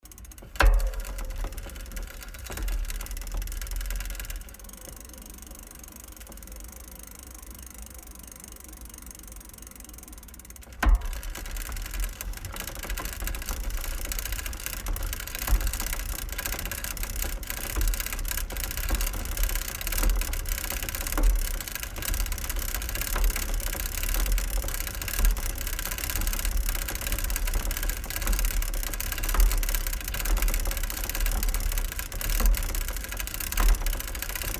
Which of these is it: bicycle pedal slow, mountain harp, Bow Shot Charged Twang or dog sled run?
bicycle pedal slow